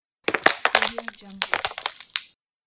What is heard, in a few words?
plastic crumpling